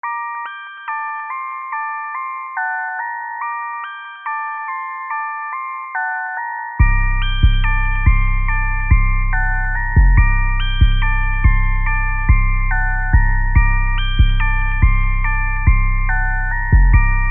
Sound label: Type Beat